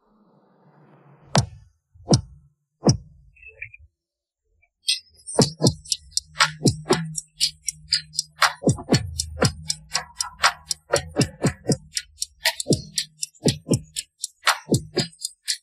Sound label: Drum